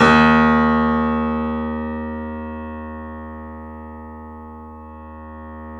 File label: The Piano D#; InVision Interactive - The Piano